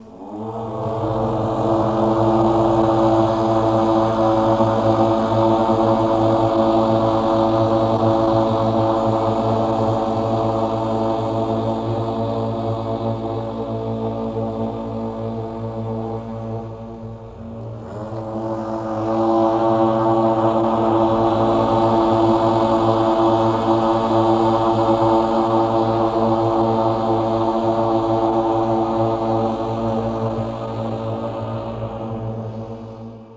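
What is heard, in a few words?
Ambience Chant Loop; underworld ascendant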